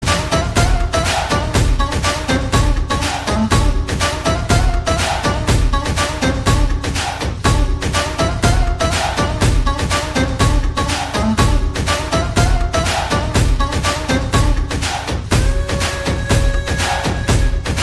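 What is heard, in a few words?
Title Song background music Ringtone; Salaar; Prabhas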